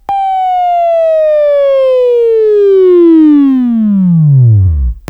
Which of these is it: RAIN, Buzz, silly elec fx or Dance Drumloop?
Buzz